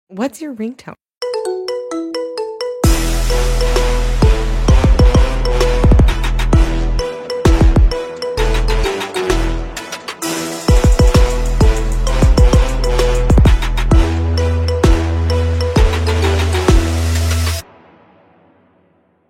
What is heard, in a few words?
iphone 13 pro max wonderland